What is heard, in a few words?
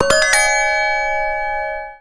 Pre alert